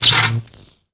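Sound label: electron sparking; PC Gamer IT CD 41; Daikatana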